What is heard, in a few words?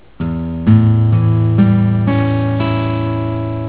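A7 chord, guitar; They are all basic chords that you will use in many songs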